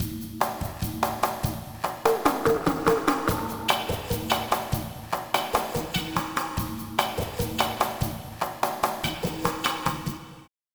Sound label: Drumz, Lounge 146; Zero-G Kocktail Kollection; Construction Kitz